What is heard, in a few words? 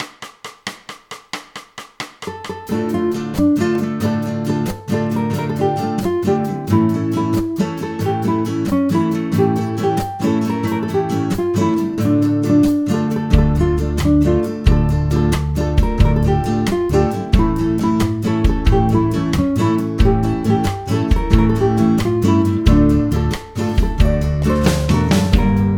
guitar